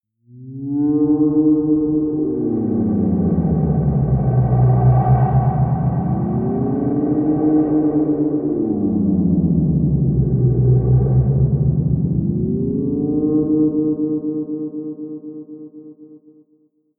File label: swaying drone; musikelemente; drones